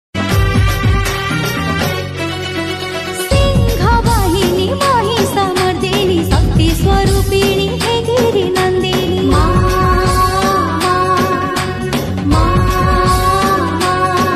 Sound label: Maa Ka Bhajan, Ringtone